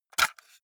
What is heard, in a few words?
cassette case insert hard; objekte; kassette